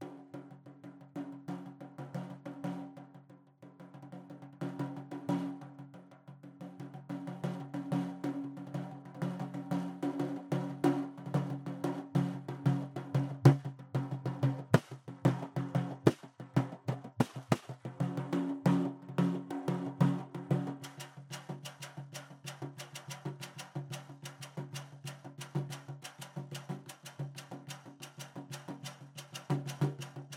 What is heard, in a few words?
Groove